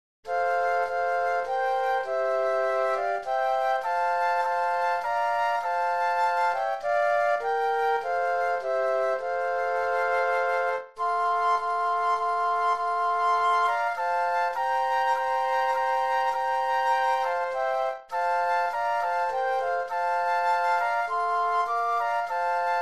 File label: Flute Trio; patriotic